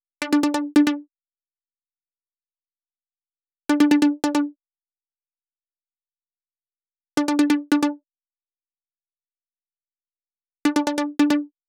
Plopper DRY 138BPM, Melody & Synth; D minor; Vengeance Samples; Nightfly Kit

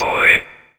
Minimal Perc; Minimal Percussion

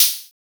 Open Hihat, Cymbals; Vengeance Samples

